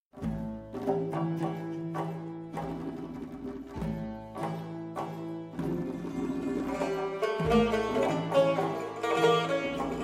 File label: Instrumental